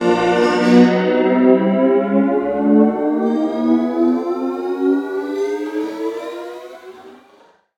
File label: Status Bar